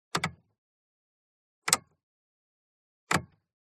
TRANSPORTATION SPORTS CAR Int Lightswitch Double Short